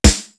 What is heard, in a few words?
TAMBO SNARE; East West; Global Sounds